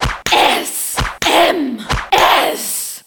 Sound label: sad sms